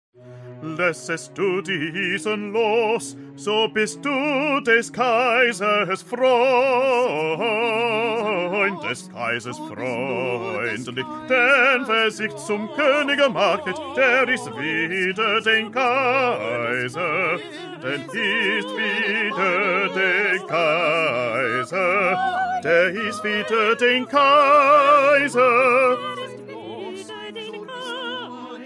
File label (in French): PETIT CHOEUR BASSES